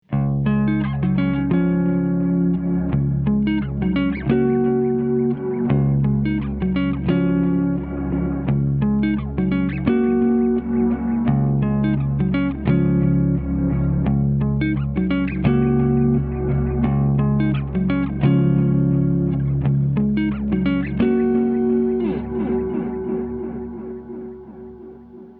duck touch sensitivity

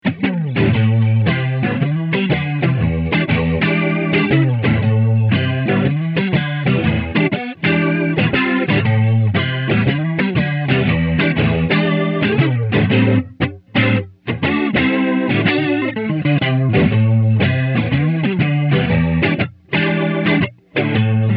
rotary; mobius

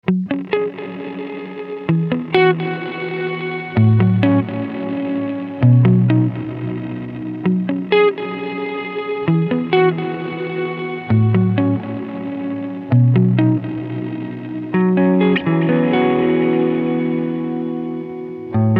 cloud, big sky; reverb; Light Showers

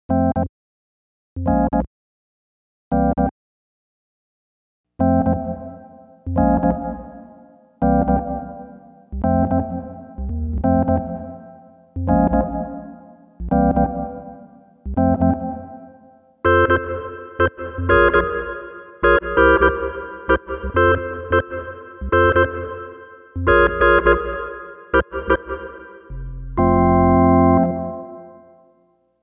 room, bigsky, organ

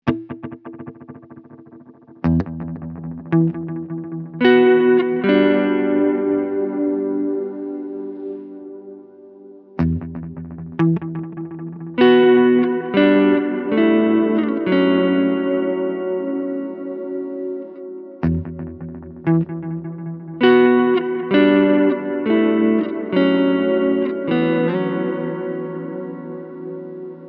Multi Echoes Slow Modulation

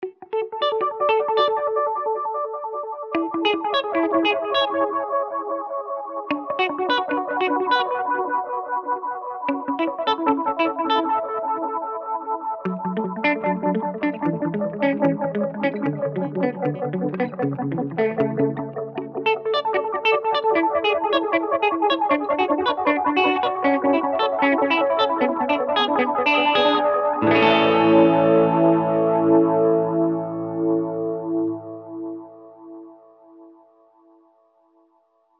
Rhythmic High Repeats

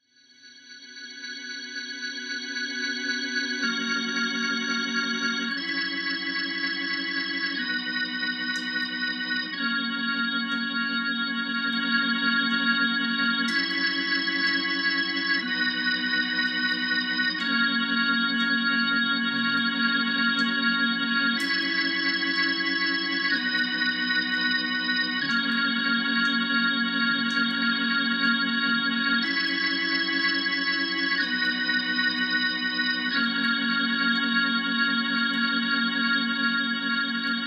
Hammond Spring With Long Delay; Max spring reverb, quarter note sync, single fixed, wow and crinkle at 75%.

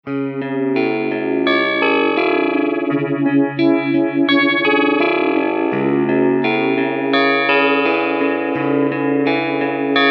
quadrature, mobius

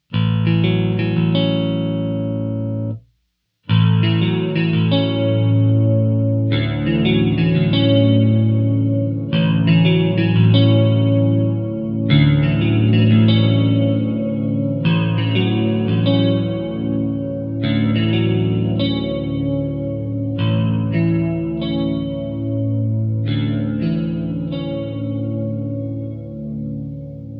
chorus; Mobius

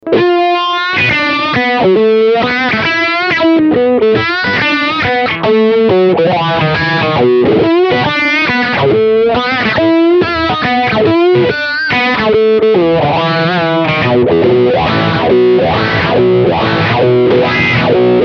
filter; mobius

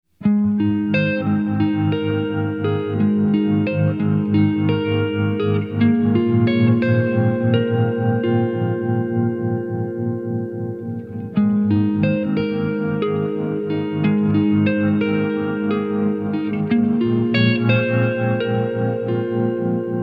blue Sky Low Murmur

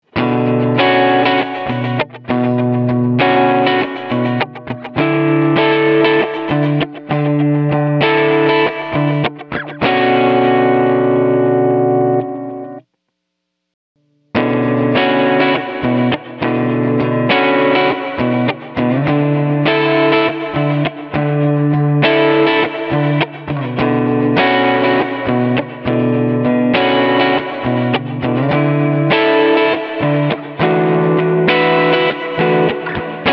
magneto; BigSky

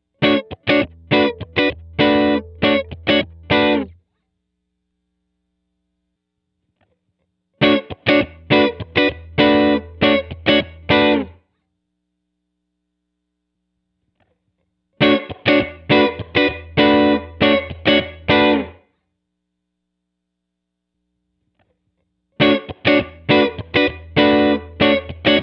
reflections; BigSky; reverb; Around the House

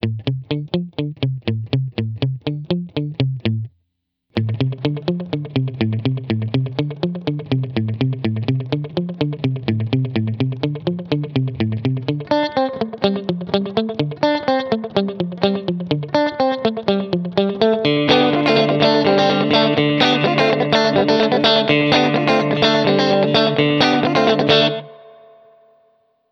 slapback delay stereo with intro

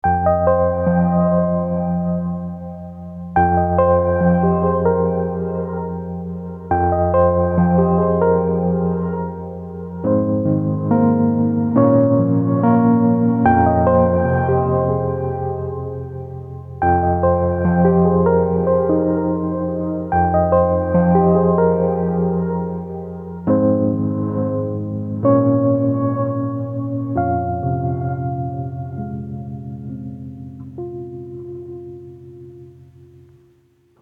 nonlinear, wurlitzer 200a; BigSky

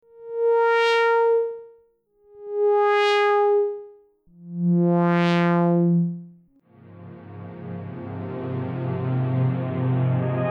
Wet Filter Verb; StarLab

